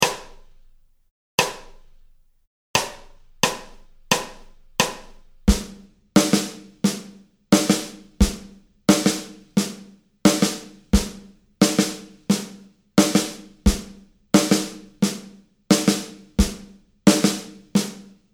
rythmique arpege, guitare jazz

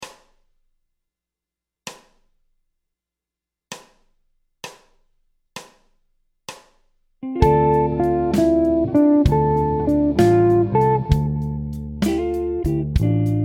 Phrase accord min, guitare jazz; motifs sur accords mineurs

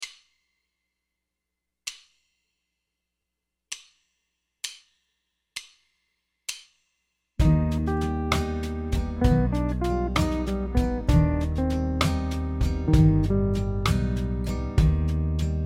G7 et Eb∆5 sur Eb∆5, guitare jazz; gamme mineure mélodique

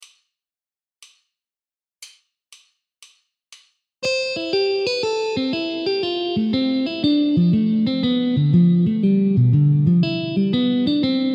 enchain pos V des arp HS sur C Maj, guitare jazz; arpèges honeysuckle; les differents types d arpeges honeysuckle

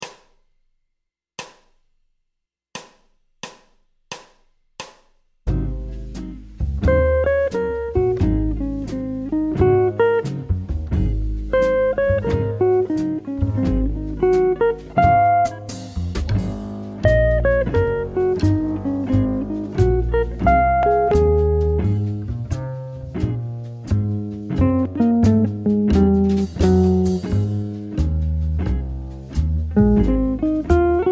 Playback, guitare jazz

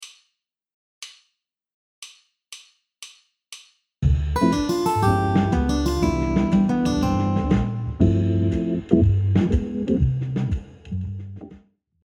ex arp HS ii V7 I ∆ en C Maj, guitare jazz

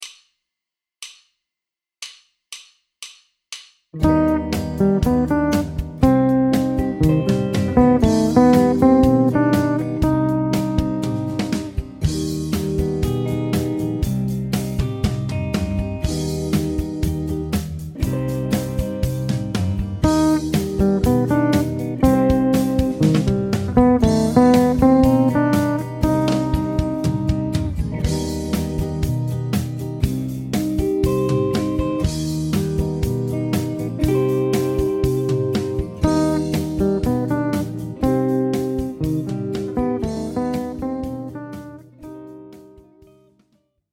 Harmonie de Cm∆, guitare jazz; gamme mineure mélodique

